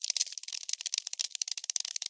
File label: crawl, insect; ambient